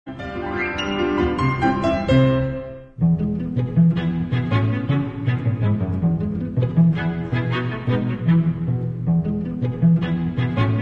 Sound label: String Quartet, Calypso; A Holly and Ivy Calypso